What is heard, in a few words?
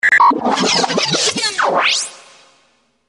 Futuristic Alert Tone Space Scifi